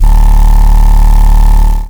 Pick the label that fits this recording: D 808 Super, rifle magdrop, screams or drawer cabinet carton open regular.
D 808 Super